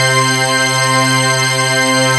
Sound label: RESTRING C4; E-MU Producer Series Vol. 2 – More Studio Essentials